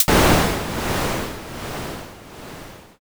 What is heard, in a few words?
thunder